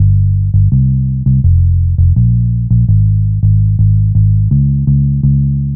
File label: Long Bass, Bass Lines 166.5; Zero-G Jungle Warfare 1, 2 & 3; 90 s Sample CDs